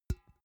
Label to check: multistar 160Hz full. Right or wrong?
Wrong